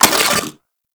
Split Laser Reload Start; Warframe; Corpus

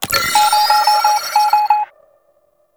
Reinforcement Notifier; Fallen From Heaven

